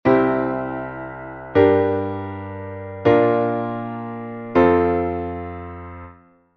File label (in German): Akkordfolge